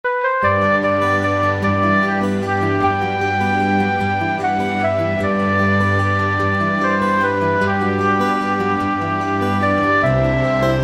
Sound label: Playful Manatee to Motor Boat